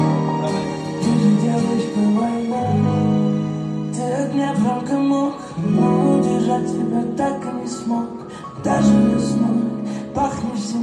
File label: acoustic